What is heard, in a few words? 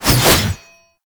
Void Heavy Scythe Charge Whoosh; Warframe; Duviri; Incarnon Adapters; Melee